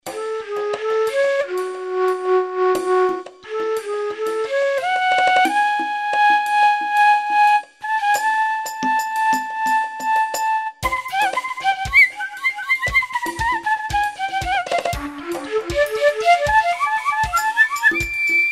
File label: flute; bambuk